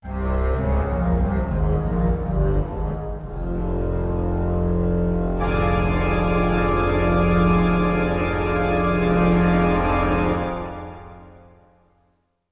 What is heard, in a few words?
Pipe Organ Horror Accent